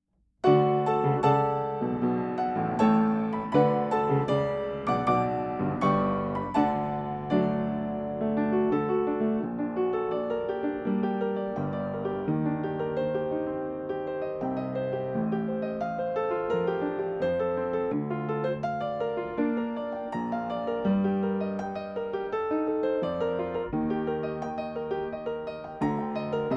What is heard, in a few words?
Section C FULL Accompaniment